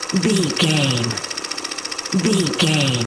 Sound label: movie camera vintage shutter loop